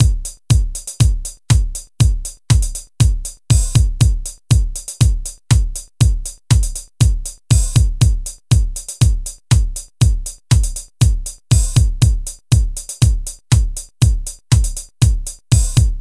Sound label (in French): Attente Rythm